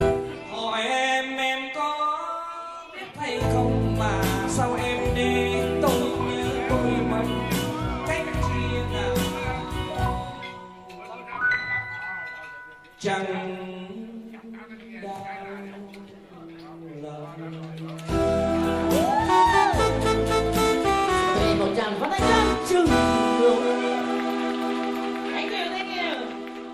vietnamese wedding ringtone

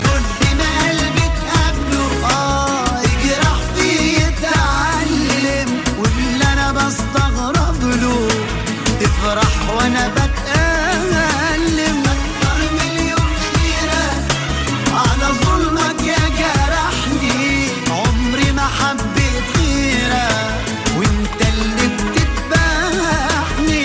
arabic sad song

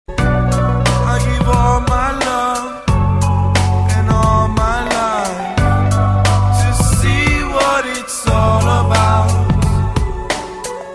ringtone

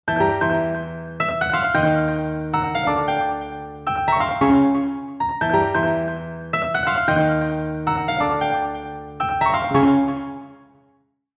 jazz piano

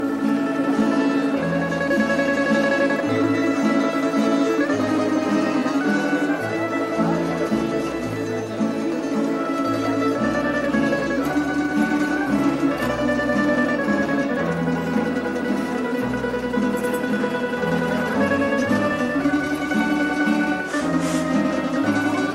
croatian instrumental; ringtone

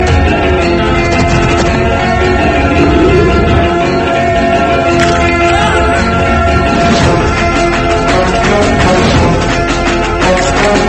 theme guitar; ringtone

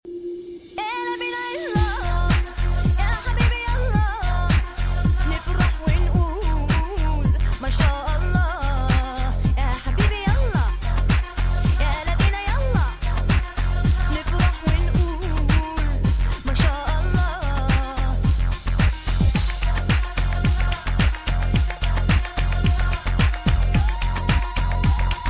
arabic remix

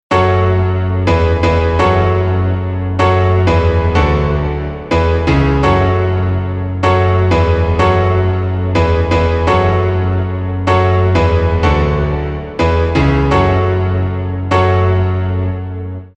dance, ringtone

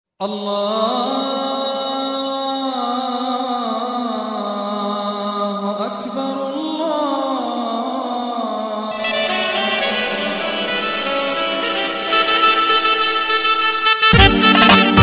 arabic mix

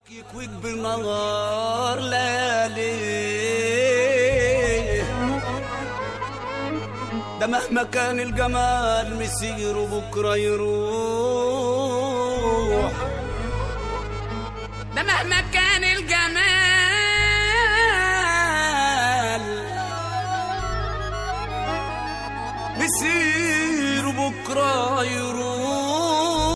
egyptian ringtone

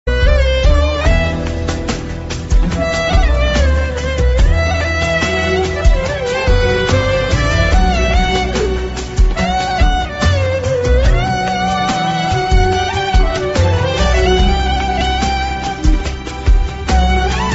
turkish soft sad music

